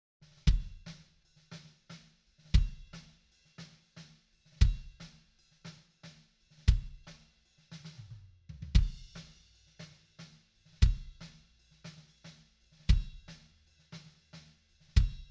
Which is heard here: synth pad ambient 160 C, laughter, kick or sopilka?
kick